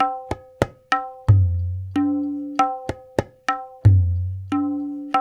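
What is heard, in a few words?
93 bpm, tabla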